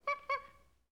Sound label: chimpanzee